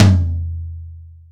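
large room tom drum one shot e key, tom drum one shot tuned to the E note. Loudest frequency: 639Hz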